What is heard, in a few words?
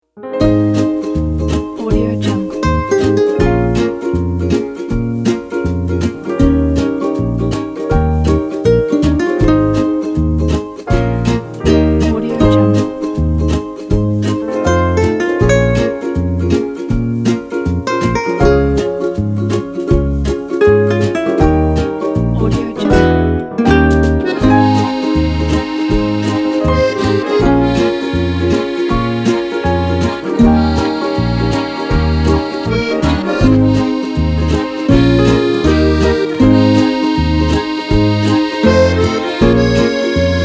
french romantic